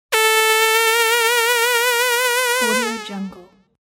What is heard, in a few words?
cartoon funny effect; frtin